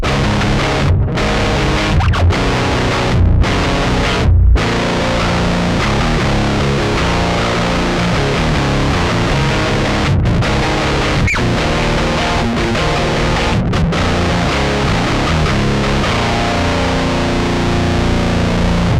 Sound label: extreme, distraptor; tl distraptor; tonelib